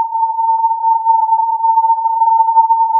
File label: filtered input